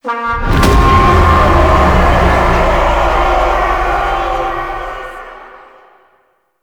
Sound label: Reinforcements Called; Fallen From Heaven